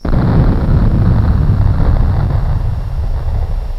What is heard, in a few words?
win